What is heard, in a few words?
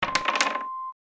dice